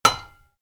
Wood hit metal ring, Wood, Light Impact: Metal, Rings Out; Materials; Stuart Duffield